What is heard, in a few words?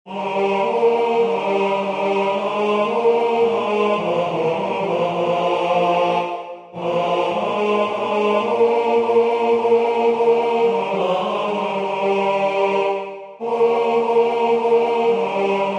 tenor; Christmas 2024